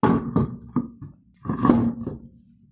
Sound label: tyre koukan